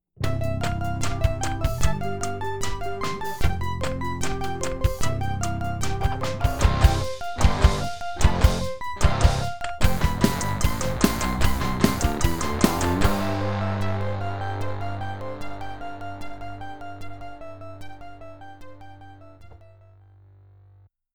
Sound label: comedy instrumental